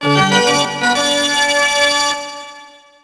organ; WhiteOut; XBOX; tundra

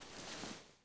body slide gritty, foley

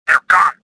escaping; worker2

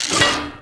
pool lever switch; school; Tony Hawk's Project 8; XBOX; inschool

